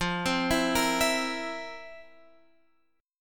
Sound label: F Major 7th Suspended 4th, Guitar, Open F; FM7sus4; x,12,12,11,13,11; arpeggiated